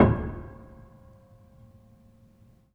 Hamm Perc, Piano Hammer Perc; Piano Miscellaneous

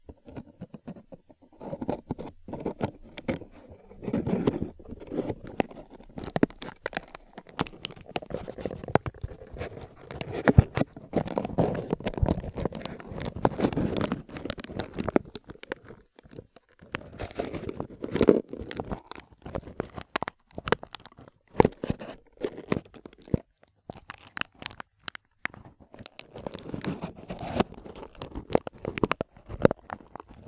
Paper

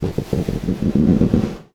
Snare Drum; Percussion Samples

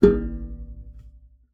Classical Guitar Extended Technique

